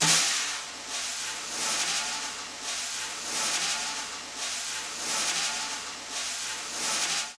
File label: New Brush Snare Swirl